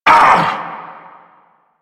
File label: Vocal Pain; Warframe; Duviri; Dax; Vocal Set A